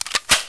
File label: ak100 safety; weapons; sa13